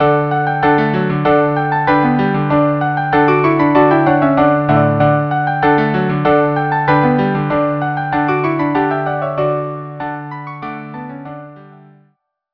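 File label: Tambourin string quartet; Princess of Navarre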